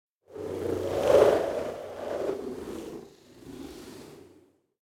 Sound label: Wind Gust Fast Cold - Short And Thin Blizzard Whoosh; Hollywood Edge; sound designer's toolkit v2